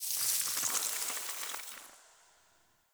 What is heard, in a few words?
Fey Eldveil Deactivate, Powers